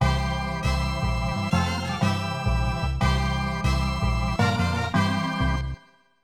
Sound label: Pirates Realm Fanfare; Glover; Nintendo 64